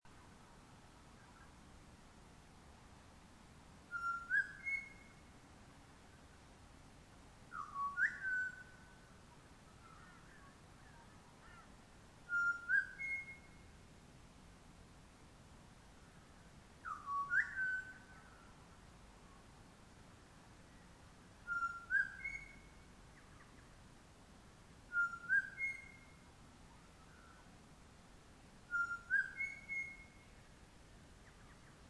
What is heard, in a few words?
dawn chorus